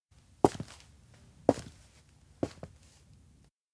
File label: steps outdoor abandoned front walk few; awayuki; anemoi